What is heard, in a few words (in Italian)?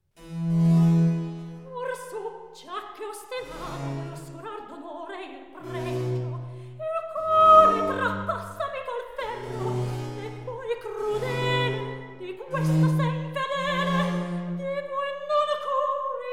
Recitativo; Il duello amoroso HWV 82; AmarilliDaliso; Or su gia che ostinato; Handel Masterworks; Klassika